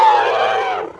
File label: tatacho damage